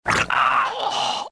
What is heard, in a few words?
groan, male, pain death; Soldier Of Fortune II Double Helix; XBOX